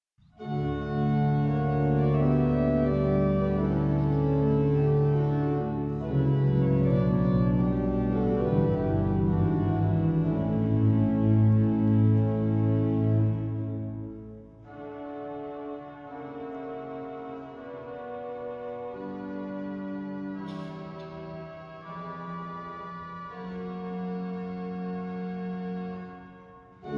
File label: preludium D dur; smetana